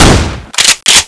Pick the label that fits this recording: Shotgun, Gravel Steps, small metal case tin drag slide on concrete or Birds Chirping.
Shotgun